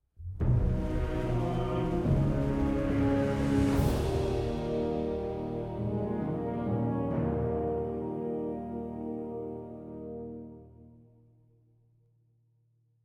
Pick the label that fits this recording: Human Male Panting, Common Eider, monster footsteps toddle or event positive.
event positive